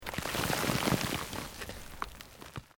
Earth Loose Move Short

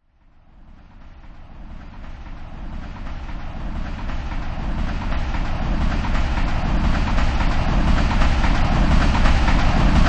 Train Freight Pass By; Vehicles; Train: Freight train pass by. Version 1.; Trains

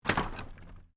Wood Planks Fall

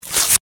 Office Index Card Rip Tear Cardboard; Ripping

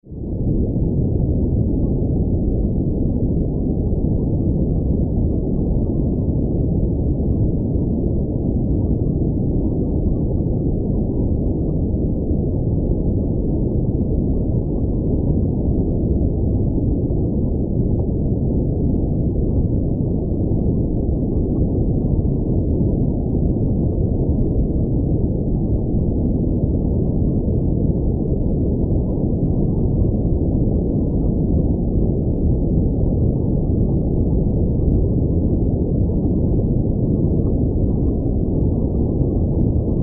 Water Underwater Ambience Deep Exploration; Nature

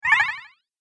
Game Laser Pulse, Video Game Sound Effects

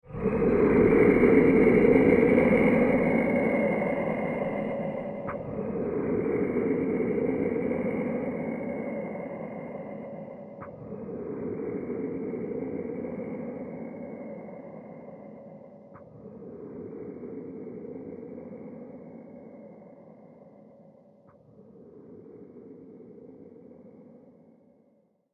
Volcano Isle, scary soundscape; fantasy sound effect; role playing games, magic videogames; Andrew Fleming

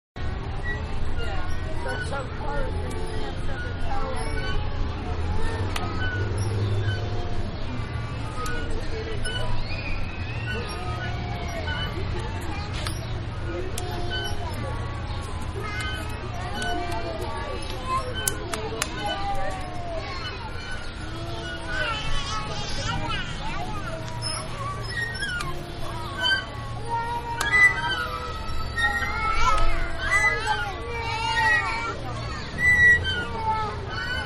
Children Playing In The Park With Swings; Playgrounds; Manhattan Production Music

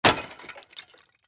Impact Automobile Headlight, Smash, Shatter; Crash Sound Effects; Crashes; Blastwave

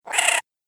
Cartoon Gremlin Baby, Cartoon Voice Sound Effects

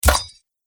Spear Pierces Armor

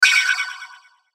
Hit Burst Warble, Hit Burst Warble High; Production Element Imaging Element Accent Transition

